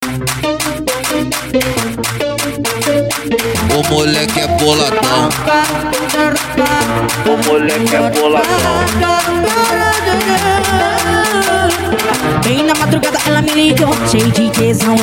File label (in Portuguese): funk consciente e funk ritmado